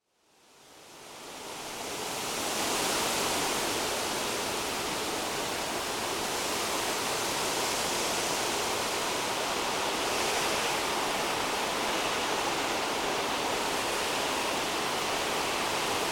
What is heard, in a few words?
Windy day